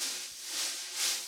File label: brush snare; Giga Samples Collection